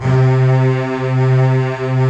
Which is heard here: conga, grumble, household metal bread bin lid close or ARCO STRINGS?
ARCO STRINGS